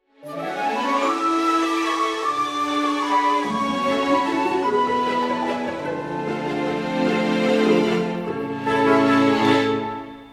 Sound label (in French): Valse; pour viennoiserie mexicaine